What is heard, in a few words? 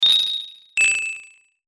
tone